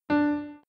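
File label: note sounds; zvuki not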